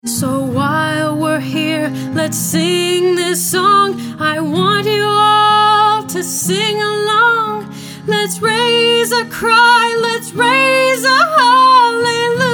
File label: ACOUSTIC LIVE